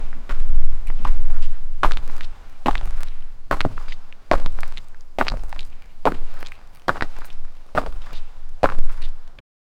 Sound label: king walking slowly with